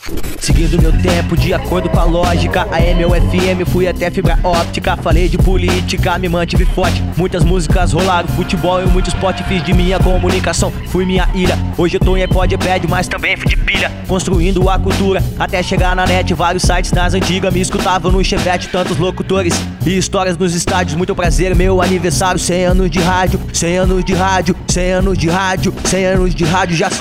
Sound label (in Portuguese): JINGLE 100 ANOS DE RADIO